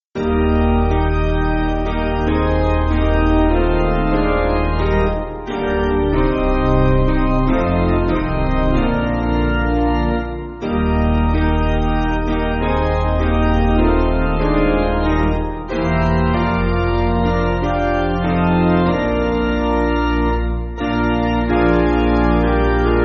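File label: Piano, Music Backing Files for Churches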